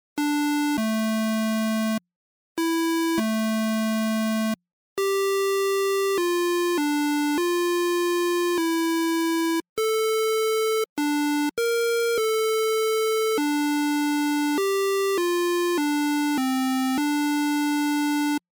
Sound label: Game over man